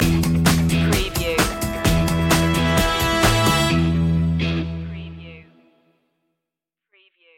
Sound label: re rock funk stinger